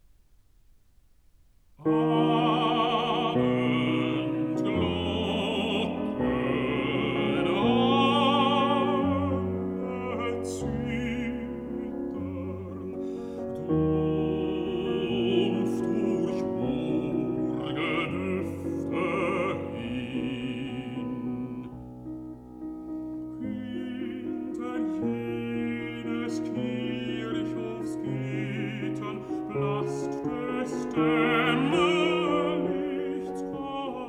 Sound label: song for voice & piano; Der Herbstabend (＂Abendglockenhalle zittern＂); (＂An Sie＂), D． 405; Schubert; Lieder